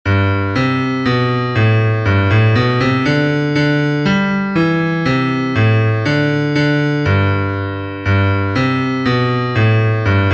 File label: Bass